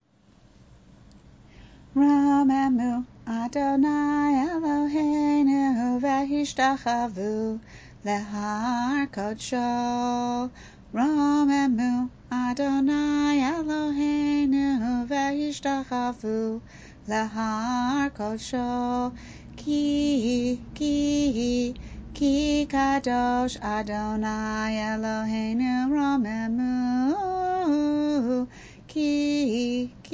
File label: psalm 99 romemu melody